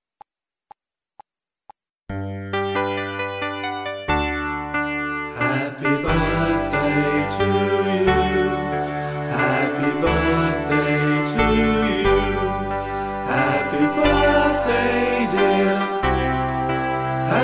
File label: Party Song Happy Birthday With Voices G